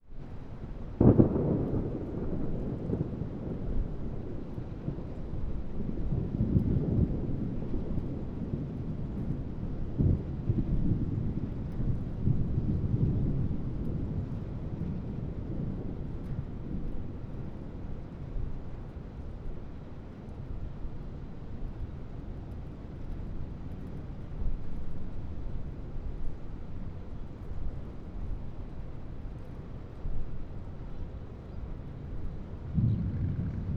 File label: Weather, Thunder, Wind; M8